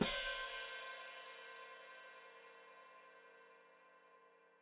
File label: Hat Closed Open, Hi-Hat